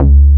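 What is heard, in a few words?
JUPITER, Synth Bass; SYNTH-Basses; Sound & Vision; Gigapack I CD 2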